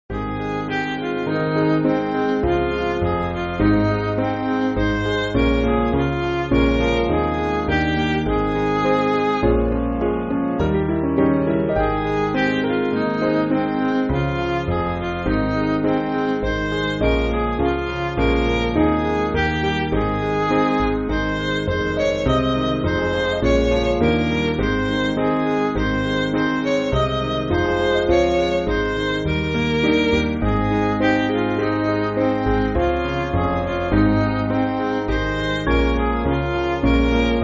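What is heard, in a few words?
Midi, Music Backing Files for Churches; Lord Dismiss Us; Dismissal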